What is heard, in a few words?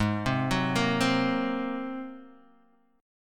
G sharp 9th G sharp Guitar Open D